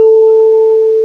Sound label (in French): diapason